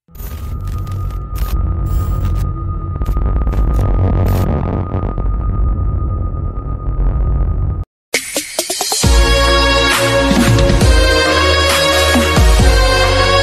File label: remix ringtone